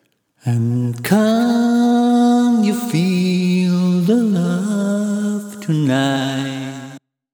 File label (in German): gesang